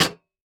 Foley Footstep Cowboy Boots Jump On Metal Platform Single; Foley Footsteps